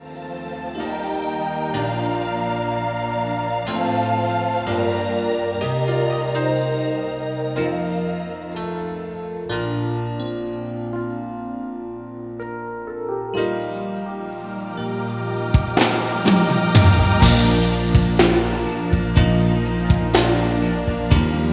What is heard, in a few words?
Instrumental soundtrack